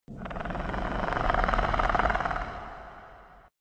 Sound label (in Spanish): ronroneo largo